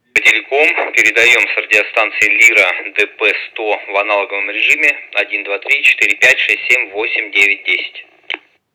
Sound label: lira dp100 tx analog